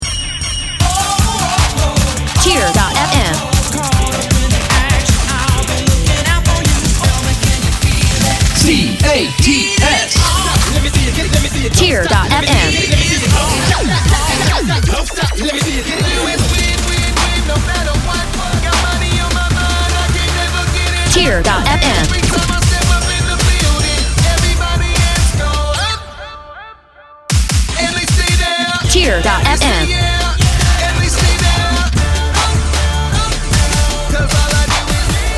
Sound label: dance with loop